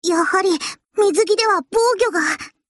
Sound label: dying